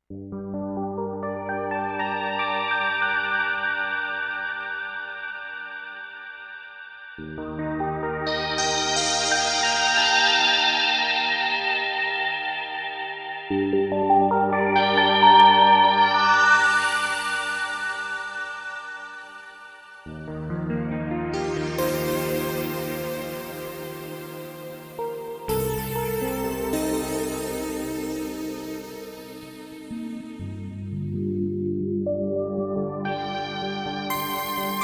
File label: Percosensitive Controller Action